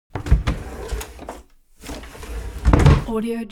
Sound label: foley office drawer open close; smartsoundfx